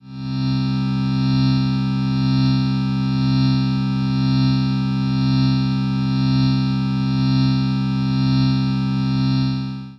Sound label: Electrical Pulse